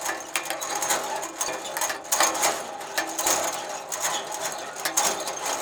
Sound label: automatic gate